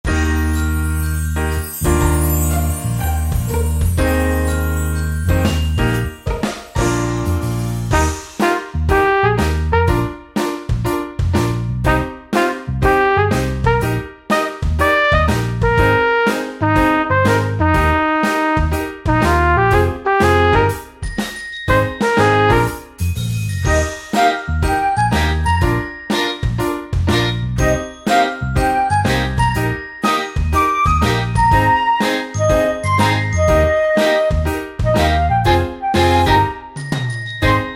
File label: happy music